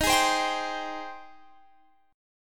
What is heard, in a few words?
G sharp Major 7th D sharp